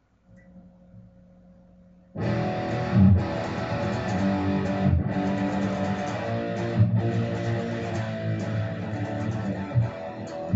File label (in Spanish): distorsion